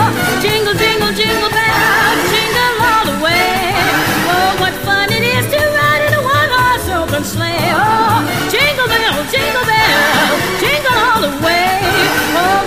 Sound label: Jingle Bells, ringtones